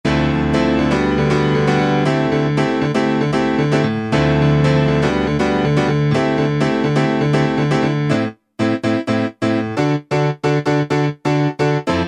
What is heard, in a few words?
House Piano; Alesis Rap Techno Dance